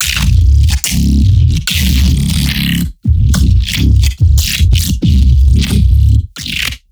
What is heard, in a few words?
neuro, bass